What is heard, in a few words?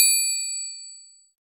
triangle, percussion